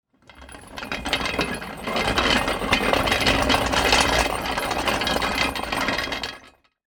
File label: Engine Stand Roll, Industry, Engine, Stand, Roll; Motor Sound Effects; Machines